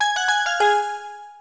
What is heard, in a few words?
Call Disconnect; sgh-d730; Digital